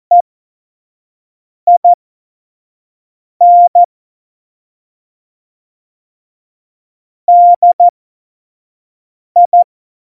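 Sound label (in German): Witz, Morsekurs, cw morsen; Tempo 25 F70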